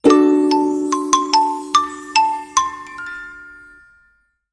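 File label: sounds with effect of completion, ending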